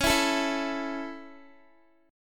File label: Db Minor Sharp 5th Db Guitar; strummed